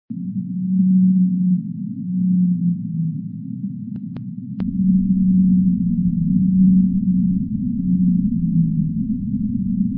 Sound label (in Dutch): Blauwe Vinvis; Antarctia